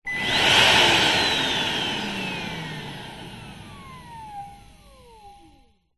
household vacuum cleaner on off burst blade; vax

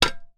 industrial tools shovel metal hit concrete floor; sound effects one

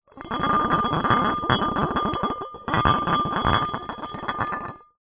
bottle wine drag movement pebblecrete; zapsplat

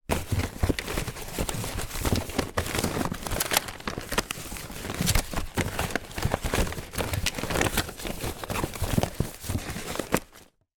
foley paper card crafts movement in wooden drawer

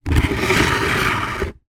bucket plastic drag on hard floor; household; zapsplat

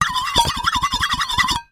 foley tin base small scrape metal knife; zapsplat

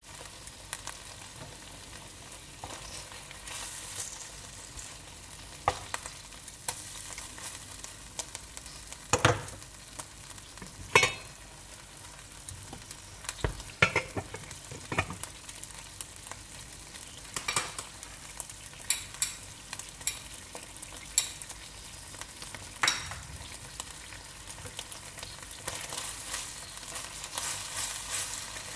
Frying Pan Sizzle Pots Lids; AMBEO; PMSFX

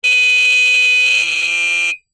motorcycle horn honk

